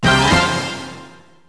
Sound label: fanfare